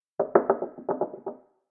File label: knock